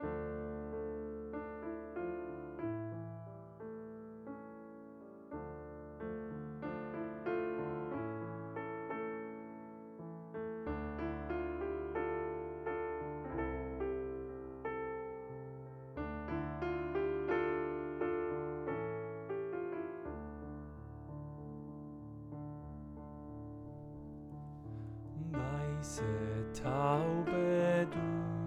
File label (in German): Sopran; Cantaarini